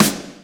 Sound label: Snare